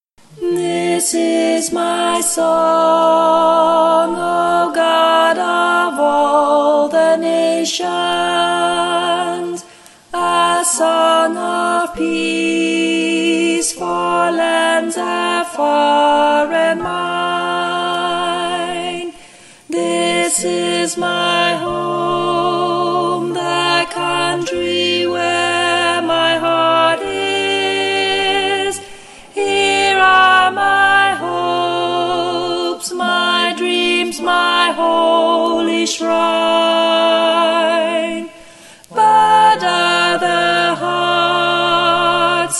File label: alto